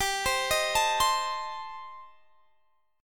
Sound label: A-Minor 7th Flat 5th G, chord